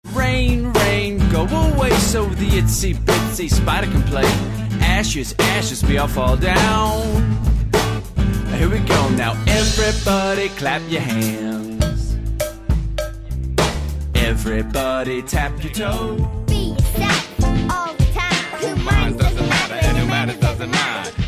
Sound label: Kid Tunes